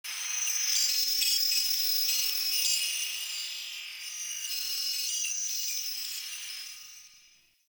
Data Texture C; Warframe; Venus Open World; Venus Solaris United

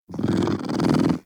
Kitten Growly Purr; Warframe; Catbrow

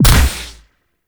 Pistol Proj Explosion; Operators; Drifter

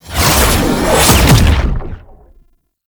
Stab Cloak Exit; Enemies; Abilities; Warframe; Tau; Dax Ninja

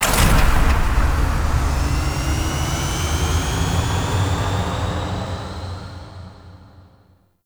Coolant Condenser Gen Power Up; Deck Twelve; Gameplay; Venus; Warframe; Venus Open World

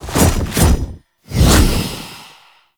Reaper Idle; Wraith

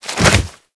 Generic Roll, Generic Foley; Warframe; Characters

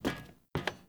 Steps; Warframe; Cinematics; Lotus Intro

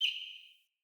Bird Single Chirp; Josalfar Bird

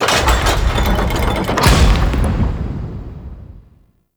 Infested Hub Door Close; Ambience; Props; Warframe; Infested Eris

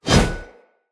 Whoosh, Swords; Weapons; Mockery; Mockery Stultion

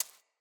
Sparrow Seed Feed Peck; Animals

